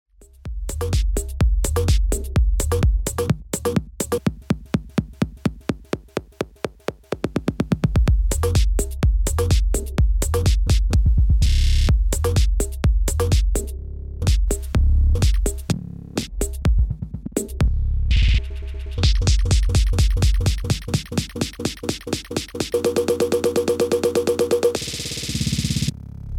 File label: Slip Roll Filter